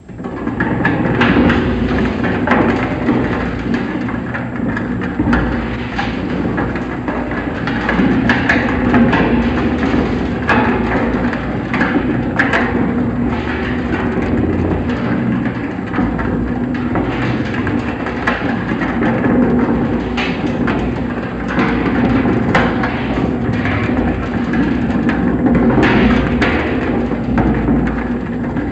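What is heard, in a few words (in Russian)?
звуки бочки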